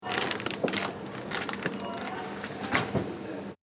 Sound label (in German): Supermarkt Verpackung